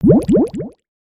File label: drink; aronwk collection; ndaudio